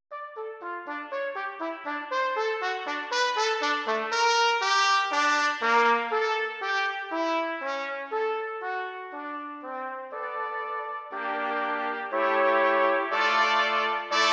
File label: cornet